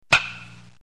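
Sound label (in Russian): звук бейсбольной биты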